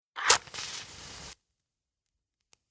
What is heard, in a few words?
Fire Match Strike Fast Single Match Flame; Flame Sound Effects